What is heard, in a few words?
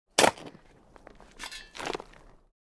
Shovel dig garden bed impact